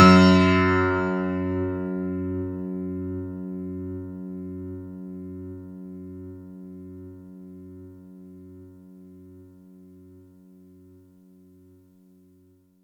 F#1, synth; 90 s Sample CDs; NiceBeats; SynthLine VOL-26

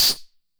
HAND CLAP, 909 Filter

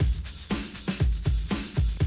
120, Classic Drum Loops

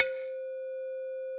ETHNIC C5, Synth; ETHNICAL

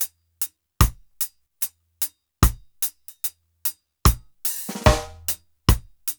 TING LP 74; AMG Gota Yashiki Groove Activator; TING LOOP 74

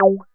GUI MULT; EastWest; Dance Mega Hip Hop Electro

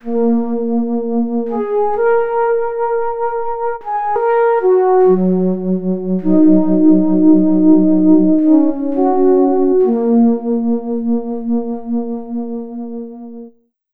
Synth Flute; Sonic Foundry (Sony Creative Software); Slow Silhouette

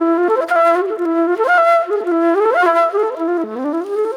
Disco Flute; Sonic Foundry (Sony Creative Software); Manhattan Flutes; Session 04 - Cruisin' Disco

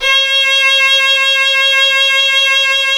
Synclavier Strings; ILIO

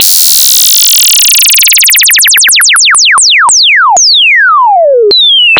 BOUNCING DOWN, BOUNCE SWEEPS; Zero-G Ambient 1

